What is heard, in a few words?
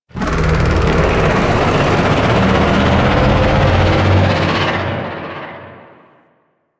Void Dragon Vocal Idle; Warframe; Duviri; DuviriVoidDragon